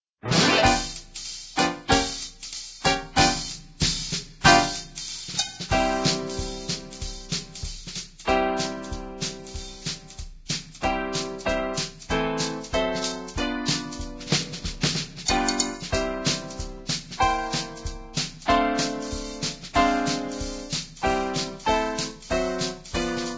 Keytar Duet; When The Saints Go Marching In

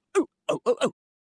Man Dance Happy; Zomboid; Lifestyle

